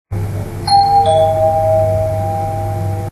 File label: elevator